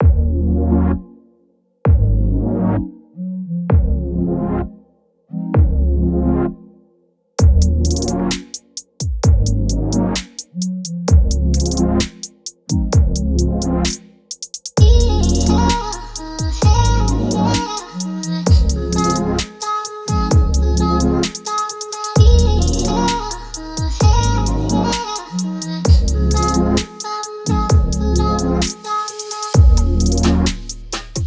Relax Chillout Chillhop

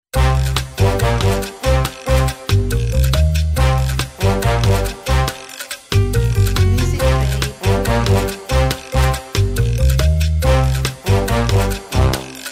Fun and Quirky Retro

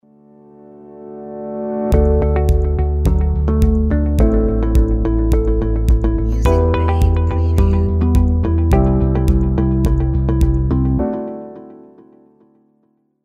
Ambient Background Ident

